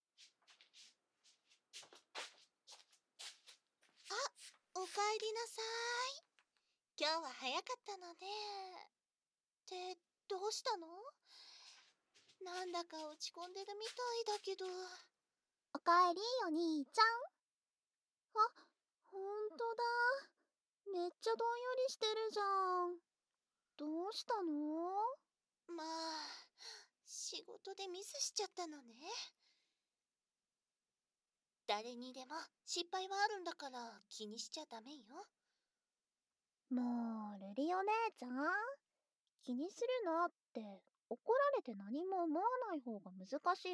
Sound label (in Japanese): 仲良し姉妹の慰め耳舐め